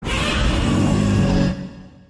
short roar; godzilla 2014

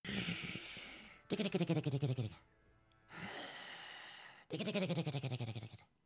air compressor

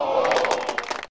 crowd applause

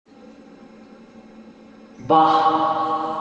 baaaaaah wow

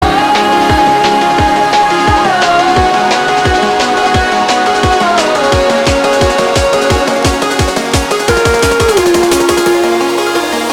song

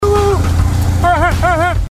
horn; luke

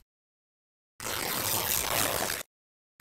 cloth tear, Fabric Ripping

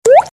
Water Drop

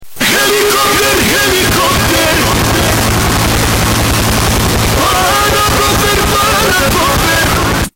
HELICOPTER

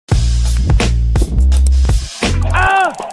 intro scream; pornhub

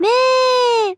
meeeeeee

